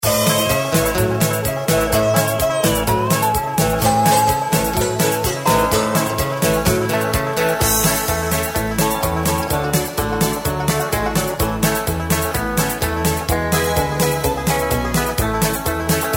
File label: harmony